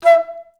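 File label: staccato E4; Best Service; Peter Siedlaczek's Advanced Orchestra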